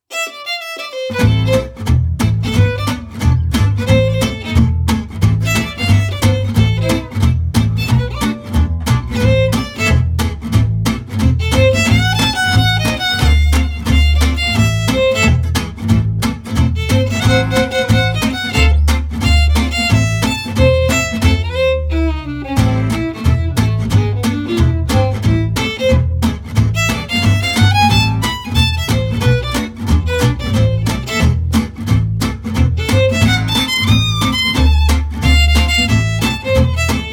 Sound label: SWING